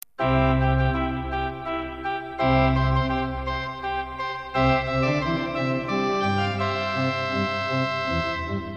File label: Trumpet Tune in C; Purcell